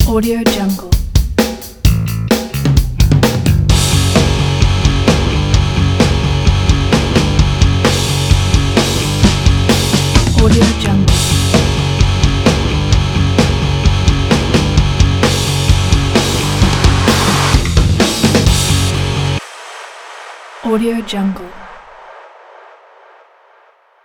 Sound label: hard rock intro logo